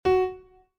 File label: Piano; Scarlet Hood and the Wicked Wood